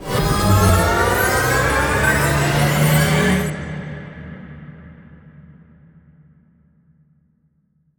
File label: near Win Wild Spin; wild bazaar